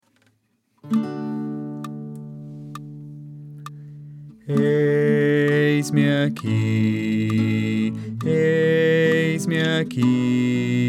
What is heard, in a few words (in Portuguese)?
Eis me aqui Baixo